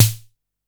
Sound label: Snare; Ableton Live 12 Lite Core Library